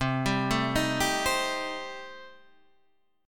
C Minor 6th, chord

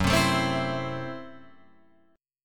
Gb Minor 7th Flat 5th Gb down Guitar Open F; Gbm7b5 strummed; JGuitar

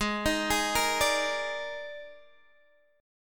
D sharp Major 7th Suspended 4th G sharp

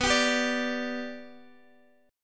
B Minor Sharp 5th, Guitar, DADGAD

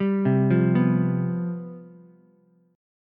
C Major 7th Suspended 4th, down, Ukulele; arpeggiated

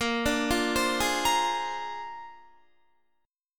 Bb 9th Bb down Guitar 4F,4A,5C,5G,5C,6E; arpeggiated

